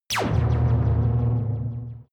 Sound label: weak laser blaster